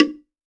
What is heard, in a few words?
LOGDR, LOG DRUM; AKAI S-Series CD-ROM Sound Library VOL-5